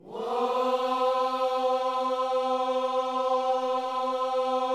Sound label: WHOA C4, gospel; Spectrasonics Vocal Planet